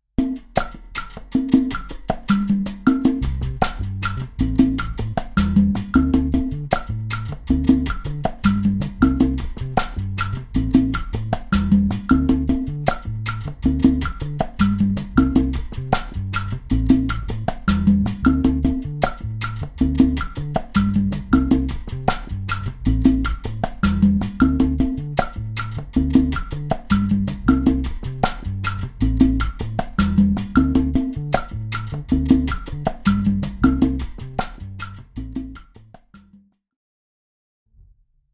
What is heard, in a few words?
Guaracha